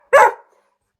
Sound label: Dog bark; Domestic Animal Sounds; Funny clips, alert moments